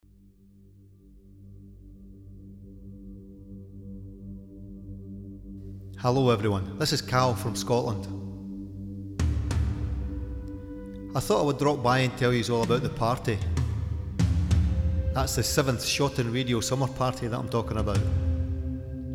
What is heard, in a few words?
celtic music; wolfrhine production; schottenradio; summer party after event trailer